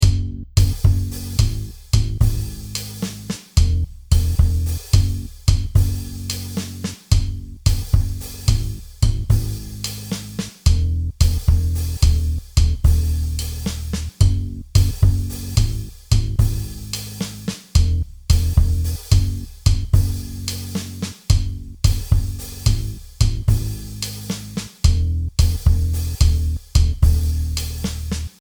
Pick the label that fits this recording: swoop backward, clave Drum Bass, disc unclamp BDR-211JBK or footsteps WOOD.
clave Drum Bass